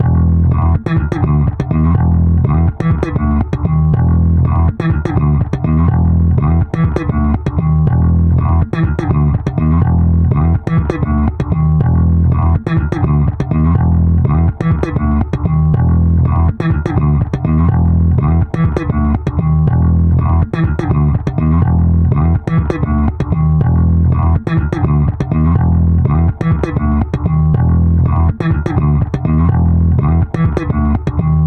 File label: bass slap; manabu murai works